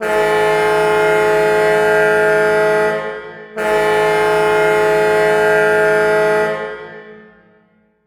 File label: Hockey Goal, Hockey Horns & Buzzers; Buffalo Chicago; SFX Kit